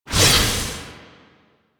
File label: Scythe Whoosh; Melee; Archwing; Warframe; Weapons; Exalted Archwing Scythe